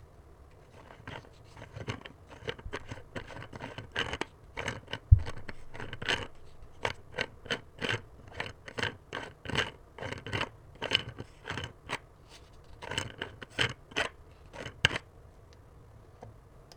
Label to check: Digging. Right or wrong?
Right